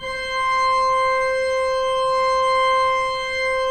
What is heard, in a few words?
pipe organ, 3-D Audio; E-MU Producer Series Vol. 5 – 3-D Audio Collection; 3D Organs; 3D Pipes Dr Joyce